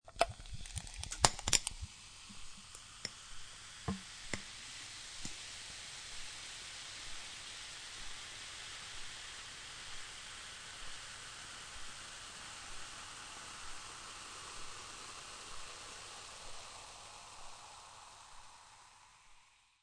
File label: Pour Soda Water Fizz Of Carbonated Drink Into Glass With Ice Cubes; Soda Gun